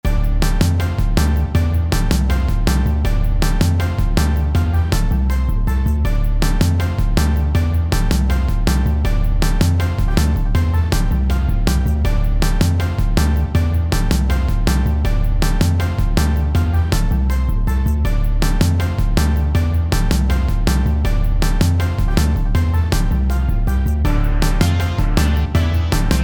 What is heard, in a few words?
Forest 110BPM Explore Epic; Dragons Destiny OST